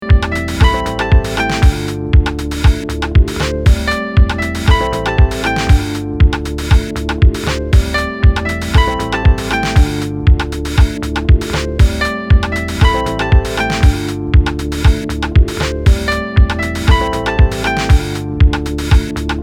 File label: Piano's Blow